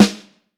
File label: Vintage Snare Drum, 6.5X14